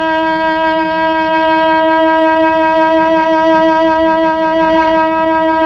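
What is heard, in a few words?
Mellotron Cello D#5, Tron Cello; Keyboards of The 60's and 70's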